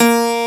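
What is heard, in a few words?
keys, pop piano; Pop Pno+MKS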